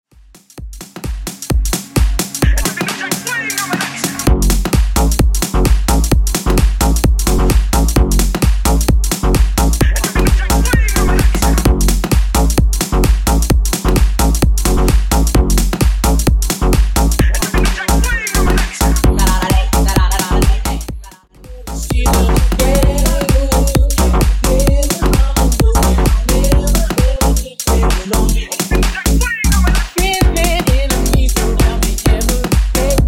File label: Funk Bass House Remix 130Bpm